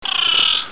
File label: burst